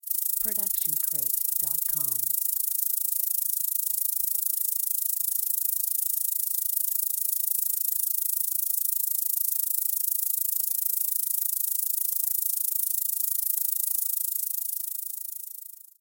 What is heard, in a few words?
insect wings; soundscrate